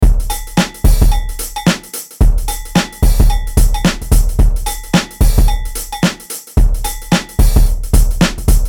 Gospel (110BPM)

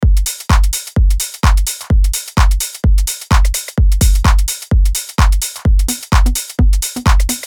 House & Deep House (128BPM)

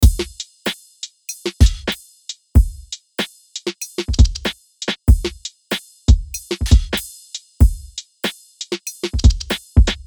Lo-Fi Demo 95BPM

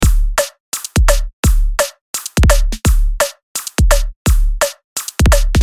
Drum & Bass (170 BPM)

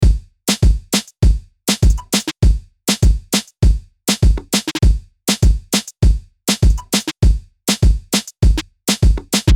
Reggaeton (100BPM)

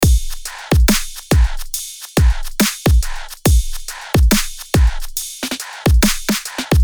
Dubstep (140BPM)

